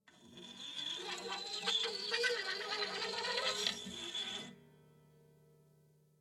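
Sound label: zither coin scrapes contact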